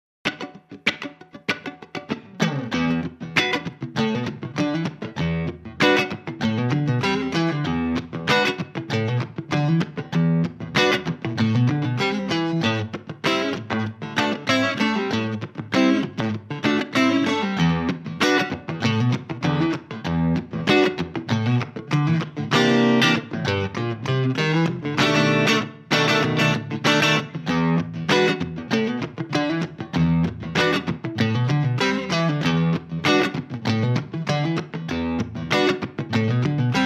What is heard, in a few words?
funk blues minus